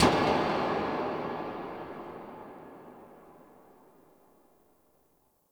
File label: Creepy Metal Swell Clank; Warframe; Entrati; Dante Unbound Leverian